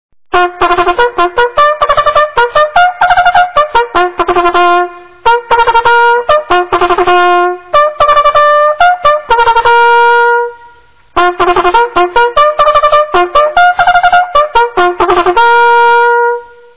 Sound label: stable, Bugle calls; American Civil War